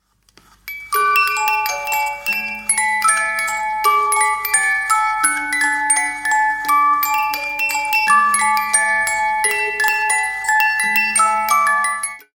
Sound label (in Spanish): caja redonda